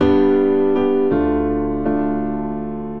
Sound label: Progression Downtempo V - ii C Major 80 bpm, Grand Piano; MIDI Clips; Ableton; Factory Packs